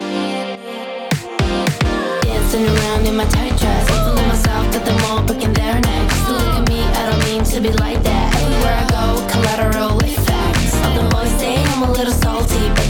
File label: neutron phase on; neutron 5